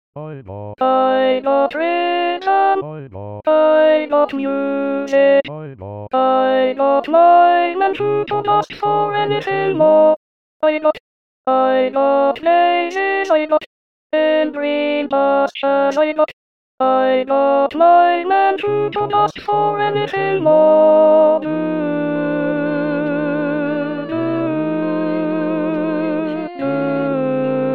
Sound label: Alt2